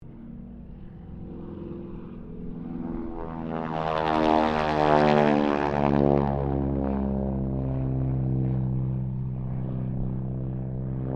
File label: Harvard squadron 4 pass, stereo